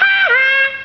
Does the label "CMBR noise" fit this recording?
No